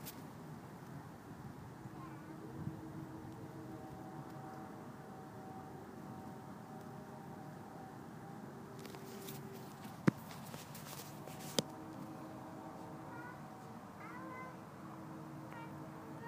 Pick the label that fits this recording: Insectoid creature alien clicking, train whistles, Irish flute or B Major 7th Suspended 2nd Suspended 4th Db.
train whistles